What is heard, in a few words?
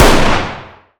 fire dist 300blk rif int; gmod; arccw uc commons attachments